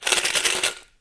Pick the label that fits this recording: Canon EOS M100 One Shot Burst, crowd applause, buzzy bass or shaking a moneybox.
shaking a moneybox